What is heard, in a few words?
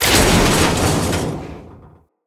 Internal Ship Damage; Vehicles; RailJack; RailJack Hit Effects; Railjack Hull Hit Effects Internal